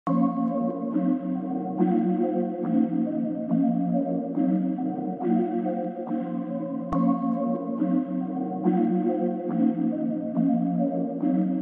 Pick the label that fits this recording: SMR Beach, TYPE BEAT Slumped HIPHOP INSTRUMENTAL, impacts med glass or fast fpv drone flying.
TYPE BEAT Slumped HIPHOP INSTRUMENTAL